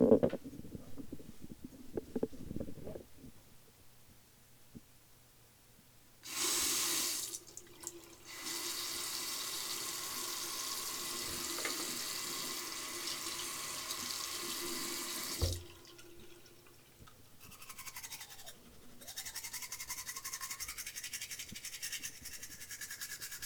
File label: Brushing Teeth